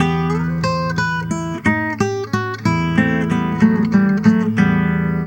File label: Acoustic Guitar; Guitars